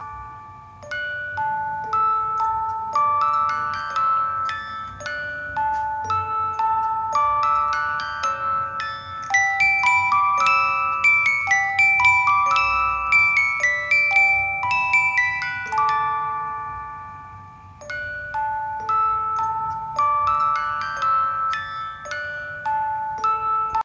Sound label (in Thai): กล่องดนตรี